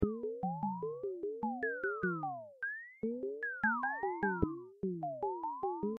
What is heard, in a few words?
synth; monopol